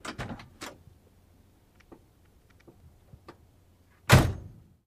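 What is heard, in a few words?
download Sound, Chevrolet Corvair, Trunk ( Bonnet ) Lid Open and Close. Close Up.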